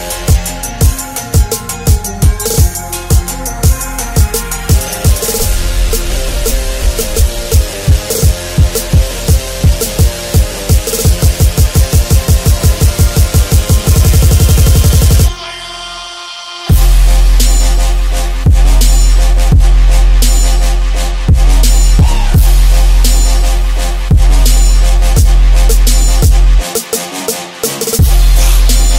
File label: Bass Boosted